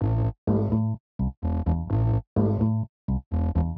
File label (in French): basse reprise resonance